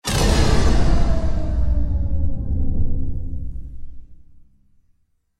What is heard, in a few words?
Horror